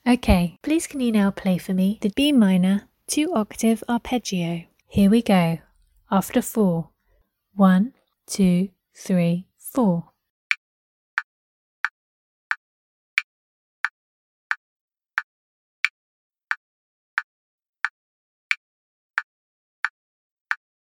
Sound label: b minor 2 oct arp